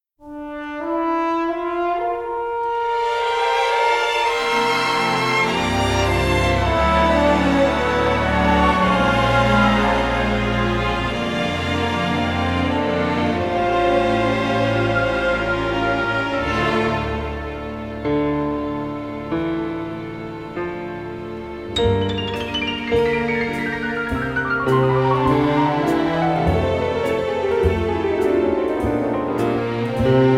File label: romantic strings & orchestra